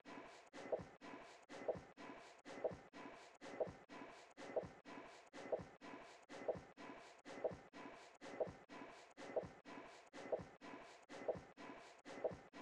Drum Loop - 125 BPM Texture; Loop Stems; Cymatics; Antidote Drum Loop

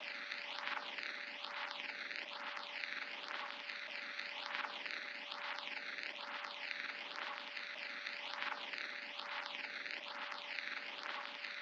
Destroyed Crackled Noise, Lofi

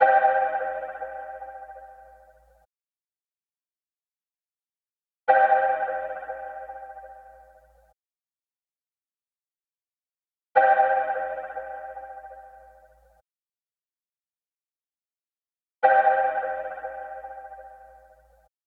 91 BPM G Min Ambience; Loop Stems; Cymatics; Gravity

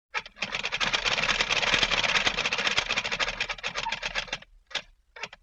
Window Shade Unwind; Vintage Cartoon; Foley

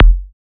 Kick D, Psy Kick; Drums; Ghosthack; Ghosthack Advent Calendar 2017 Day 9 - Psy Kicks; Ghosthack 20 Free Psy Kick

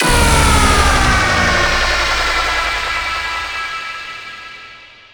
Blur Impact, Dubstep; Ghosthack - AC2024 - Day 13 - Dubstep Sounds 2025; Effects

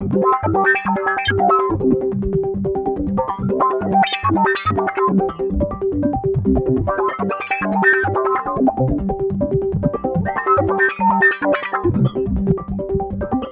Maganed G 142 BPM, Psytrance Arp Loops

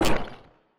Scifi Alien Weapon; Game Weapons; Military; Game Essentials

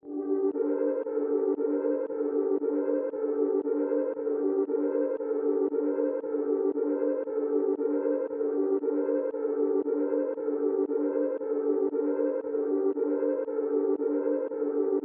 116 BPM G Min Arp Low, Loop Stems; Cymatics; 2022 Melody USB Expansion; Needs

